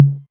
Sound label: 808 Tom Mid, Drum Machines